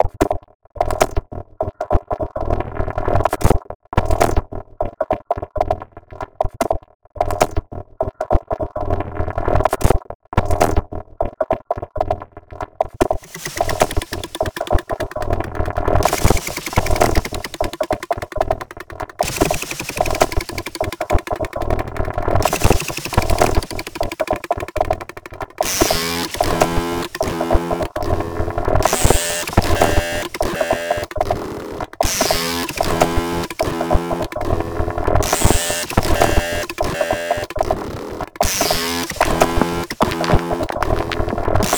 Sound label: Unbearable Noises